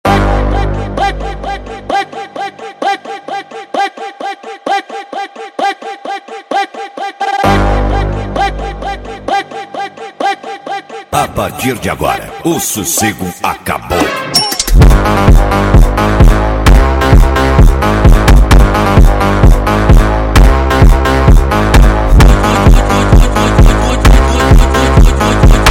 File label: sped Up, funk